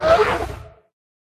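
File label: riding lizard wound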